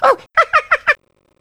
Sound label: hunter pounce miss; catnap n grabpack; elmo